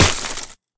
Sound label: Impact Ice; smite; god merlin